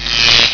sizzle

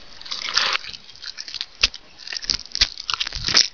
barnacle chew; gearbox

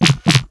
deny select

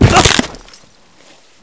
player fall pain

